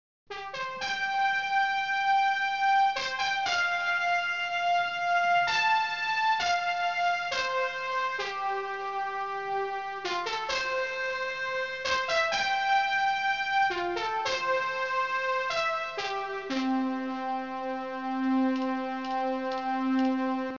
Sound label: Fanfare